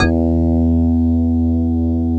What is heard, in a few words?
METAL BR